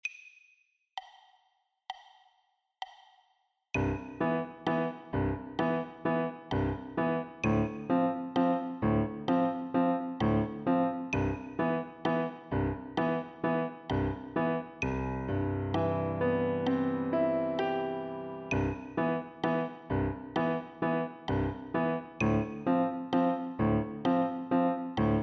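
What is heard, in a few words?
celebration tempo 65, Pianoforte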